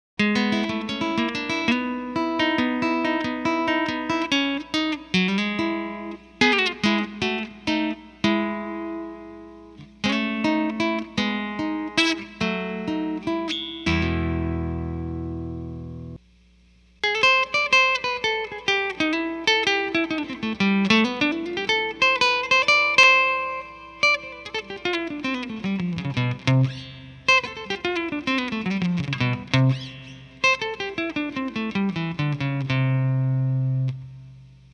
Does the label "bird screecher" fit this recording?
No